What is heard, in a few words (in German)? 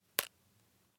Lutschbonbon